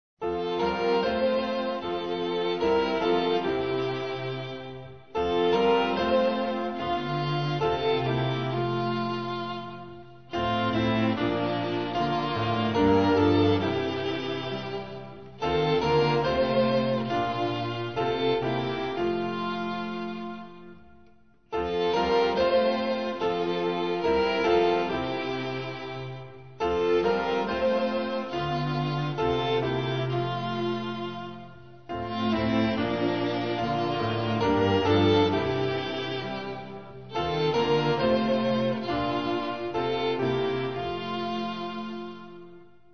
let the holy spirit guide instrumental; hymns portuguese

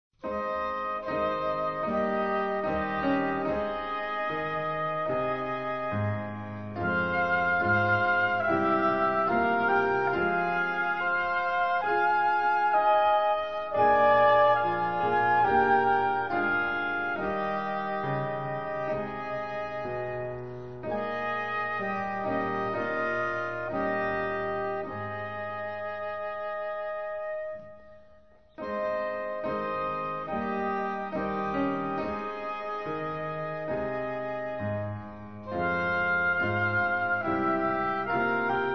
now the day is over instrumental; hymns french

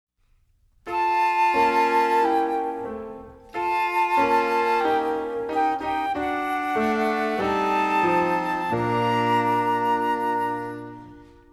the still small voice music only; childrens songbook

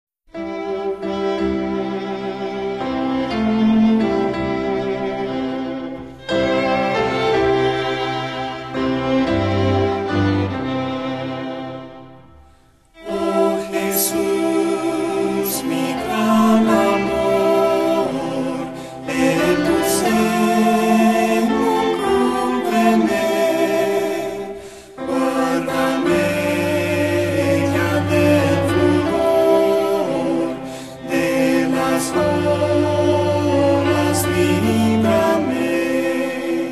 vocal and instrumental; hymns spanish

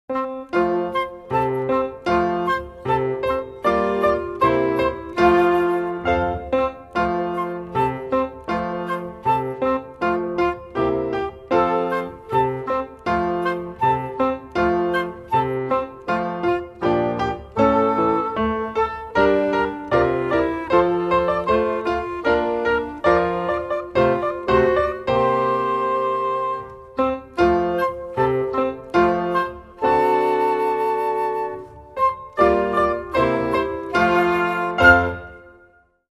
music only; portuguese; childrens songbook

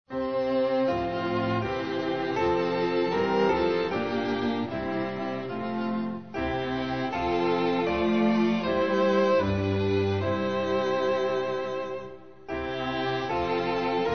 this house we dedicate to thee instrumental; hymns portuguese

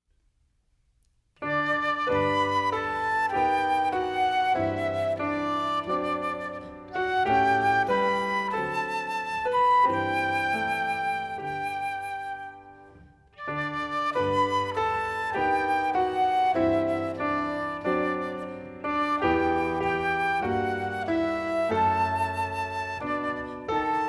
a prayer song music only; childrens songbook

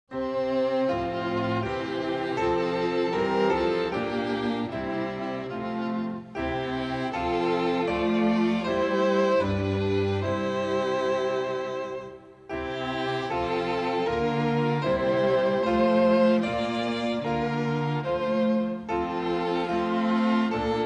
hymn instrumental; this house we dedicate to thee

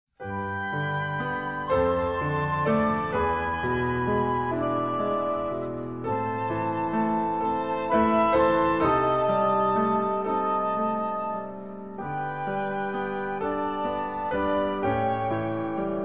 love one another instrumental; hymns french

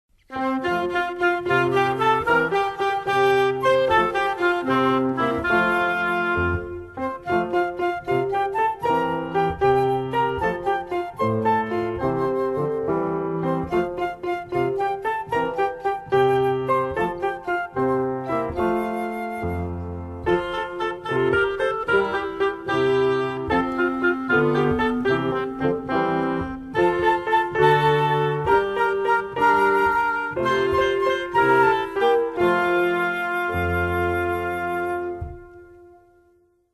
music only; childrens songbook; Chinese

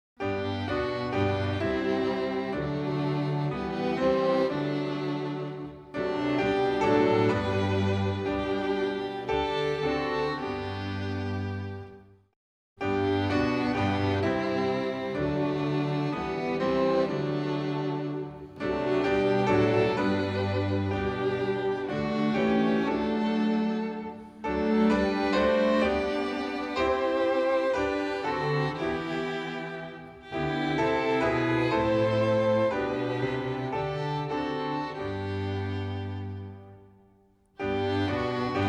hymn instrumental

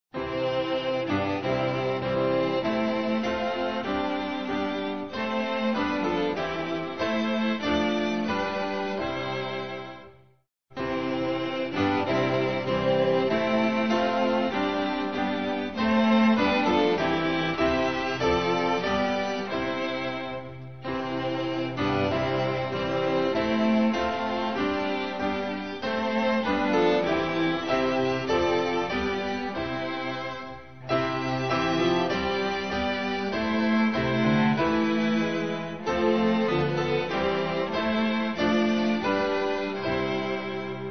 he is risen instrumental; hymns portuguese

